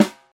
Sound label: fat snare one shot A key